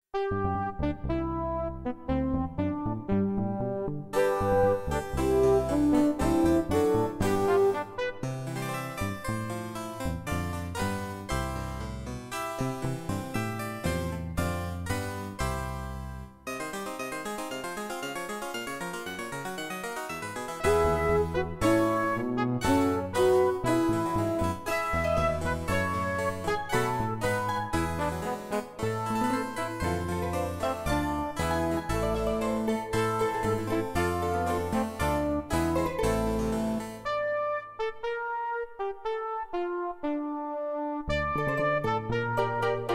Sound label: Fugue; Spellcraft Aspects of Valor; MS-DOS